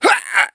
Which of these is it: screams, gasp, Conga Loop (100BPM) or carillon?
gasp